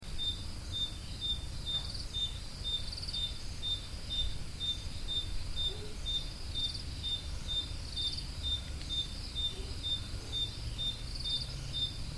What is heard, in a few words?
roodekopjes crickets